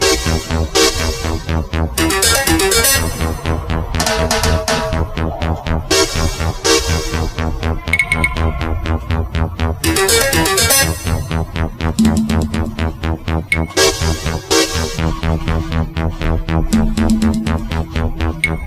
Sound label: Action Movie Music